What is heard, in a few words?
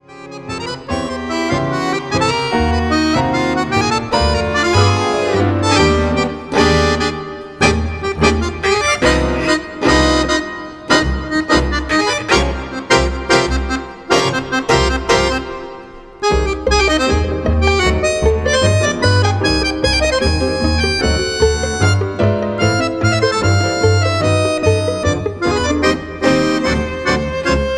Heavy Tango